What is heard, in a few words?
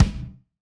Kick, drum